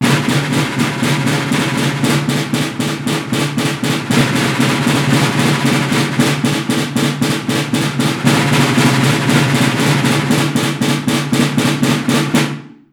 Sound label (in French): la Charge avec roulement